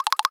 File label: Knock, Natural Elements notifications; Google; Google Sounds